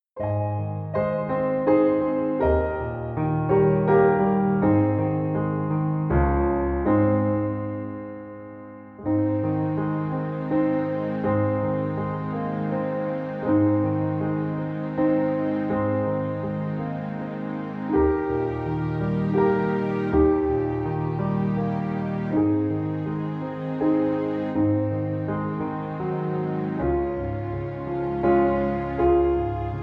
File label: Silent night karaoke